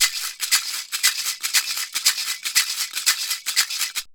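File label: maraka groove; Best Service; World Colours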